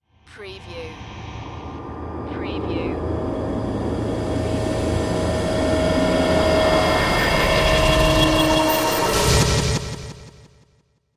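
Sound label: Production Element Swell; Sound Effects Bible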